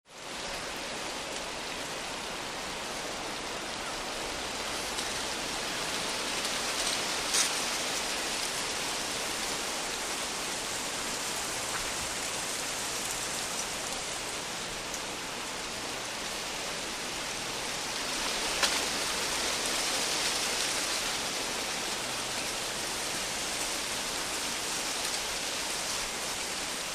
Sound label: Rain Outside Barn